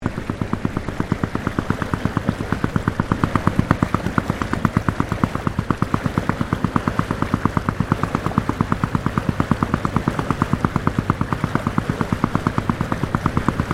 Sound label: Boat Small Engine Inboard Wake Stereo, Inboard boat engine running badly